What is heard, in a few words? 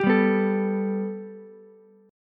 G Major Add 9th Ukulele C6, chord; 12,7,7,12; Gadd9 strummed